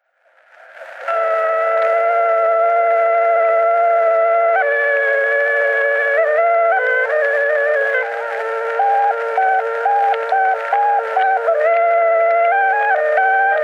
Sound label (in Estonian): torupill; Torupilli Jussi 6. lugu; Emmaste